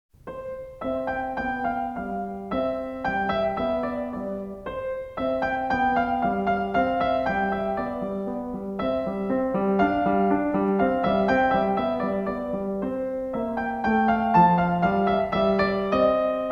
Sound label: Arietta In C Major; Magrath Book 03